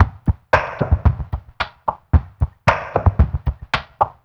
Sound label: LOFI LP; Spectrasonics; Liquid Grooves 1 & 2